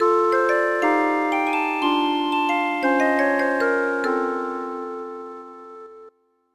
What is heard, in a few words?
Jingle Game Over; Pyoro; Paper Plane